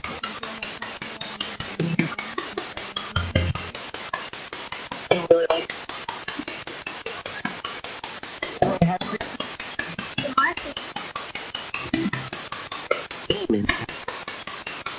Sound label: Train Station; Mars Train Station – Return Investigation 2024